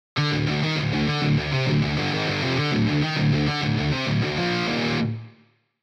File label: guitar pattern 2 gt